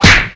hitted

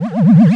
marine sphere damage

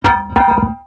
die; tamruan

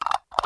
horn stand

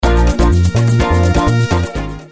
cow

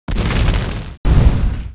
meteor storm buster; hyper novice

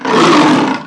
attack; snowier

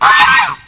frog die

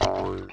move; volcaring

